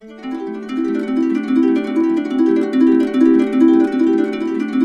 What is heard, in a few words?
BISBI, Complete Harps; USB Soundscan vol.62; AKAI